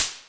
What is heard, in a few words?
hat mess